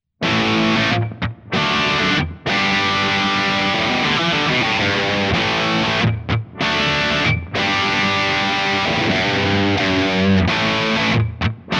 Digitale Gitarrensounds Marshall; Kemper

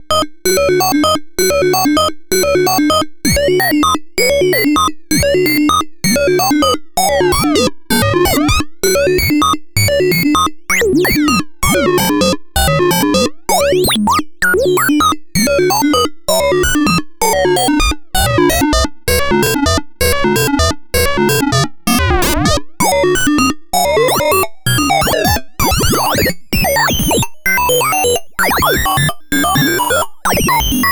Doepfer A 111 5 Mini Synthesizer Voice Filter FM via LFO manuelle LFO Frequenz Variation

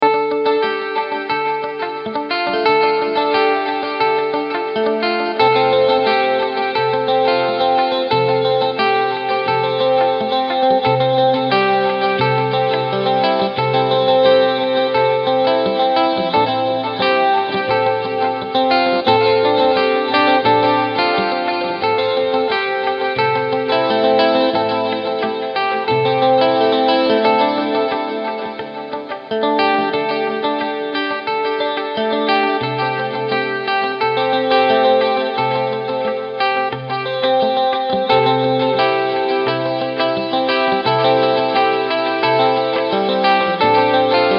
Roland SDE 2000 punktierte Achtel

